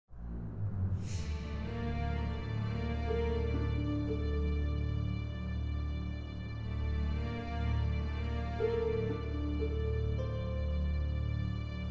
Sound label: orchestral